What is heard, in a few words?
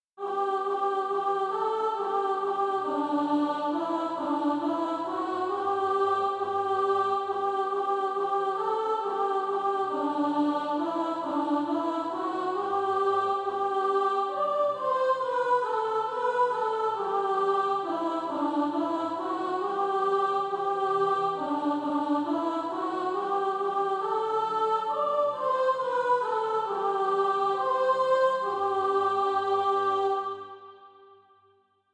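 soprano; SATB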